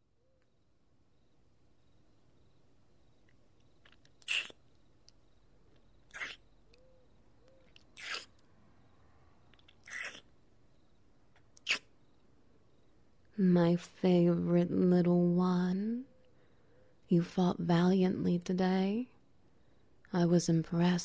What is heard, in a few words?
Ambience SFX; Orcs can be Cuddly